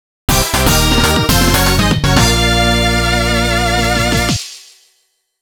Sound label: 1st Place Fanfare; Mario Kart 7